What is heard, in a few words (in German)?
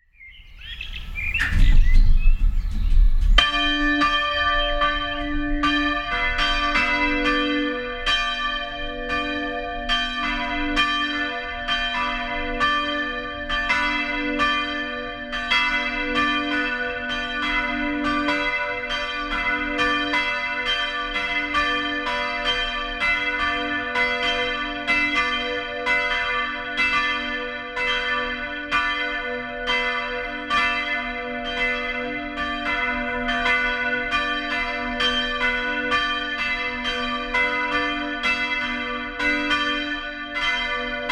Bugenhagenglocken